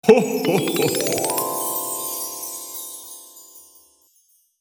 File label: Santa claus ho ho ho laughing christmas; festivities